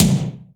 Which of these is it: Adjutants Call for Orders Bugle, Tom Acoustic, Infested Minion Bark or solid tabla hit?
Tom Acoustic